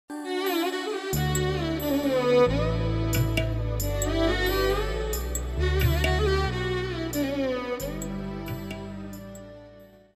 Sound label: romantic bgm